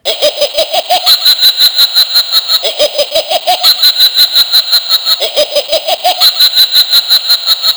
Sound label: witch laugh